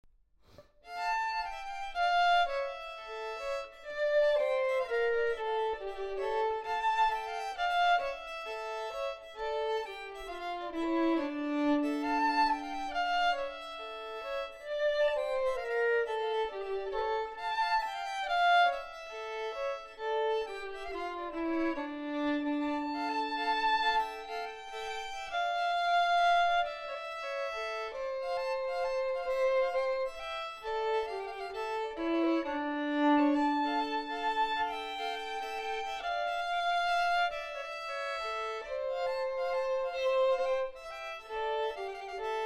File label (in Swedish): Blåsstämma; Klockarpolskan